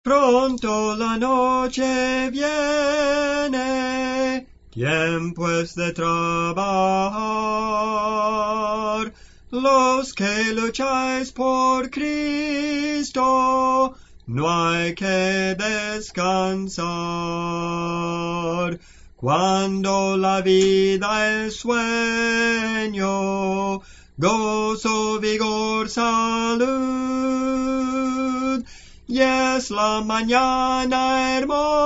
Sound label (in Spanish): Soprano, Cantos Espirituales; Soprano (melodía); Clase del Canto